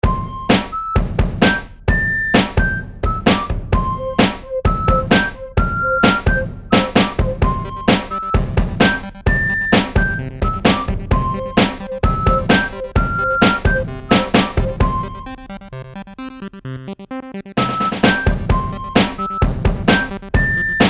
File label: Hi Five Synth